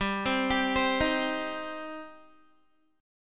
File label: G Suspended 4th Sharp 5th G down Guitar; arpeggiated; 4E,4A,5D,5G,5C,6F